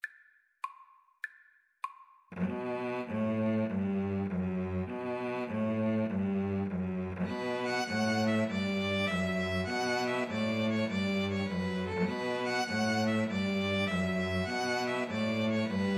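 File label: masters in hall, string quartet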